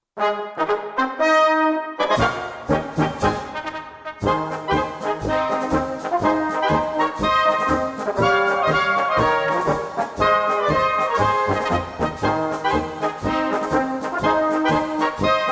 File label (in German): Marsch